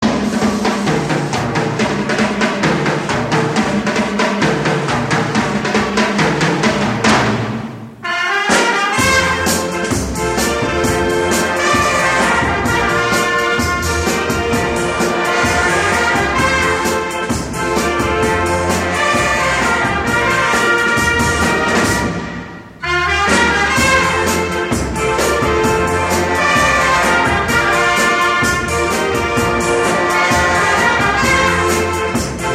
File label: instrumental